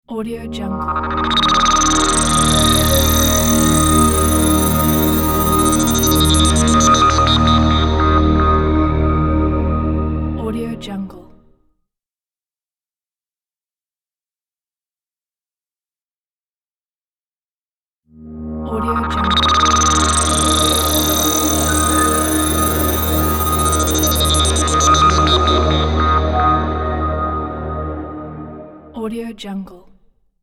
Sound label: space transition